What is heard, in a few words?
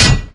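sphere impact ground large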